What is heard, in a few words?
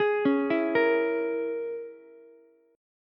Bb Minor 7th Flat 5th, Ukulele; Bbm7b5; arpeggiated; JGuitar